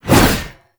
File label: Staff Swing Sweetener; Warframe